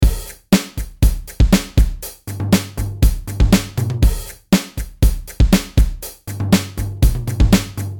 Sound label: Rock & Metal (120BPM)